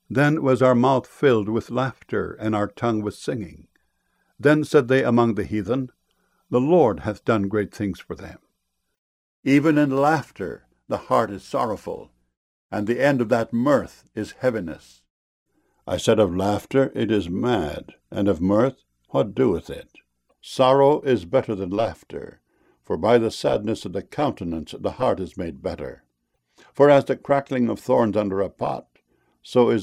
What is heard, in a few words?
laughter